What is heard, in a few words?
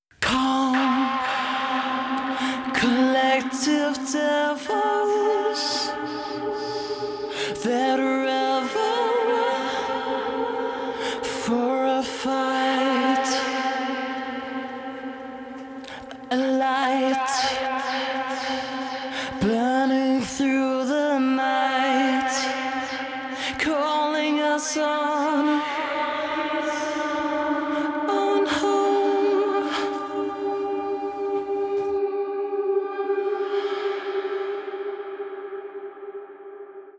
Vocal Verse